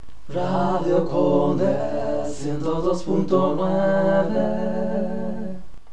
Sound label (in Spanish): jingle radio 1029, Dial cantado; 102 punto nueve